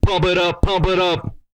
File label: PUMP UP, HIP-HOP, R&B Dance, Vocal; TB HIP-HOP